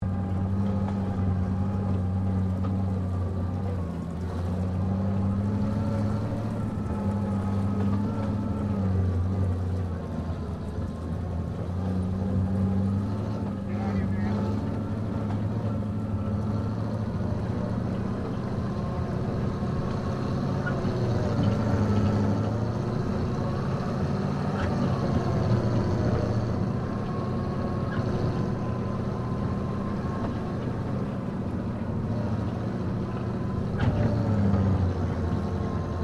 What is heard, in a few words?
TANK BREN GUN CARRIER MANOUVER DISTANT, British Universal Carrier; Trucks; Vehicles